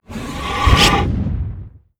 fireball

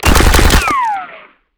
Mgun Skin

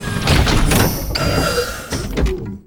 SIEGE TAKEUP; ACCRETIA

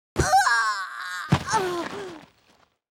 FEMALE die

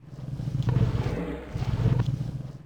Infected beast peace idle

